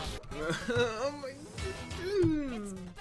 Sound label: eugh